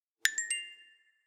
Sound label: Pleasant Done Notification